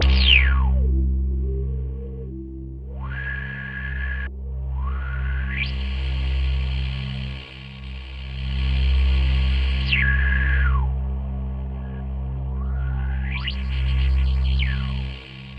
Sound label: C2, Synth Line; 90 s Sample CDs; NiceBeats; SynthLine VOL-17